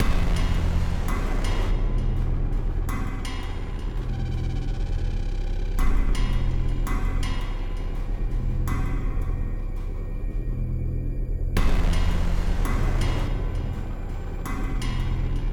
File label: BGM Horror; the letter a horror visual novel switch gamerip 2021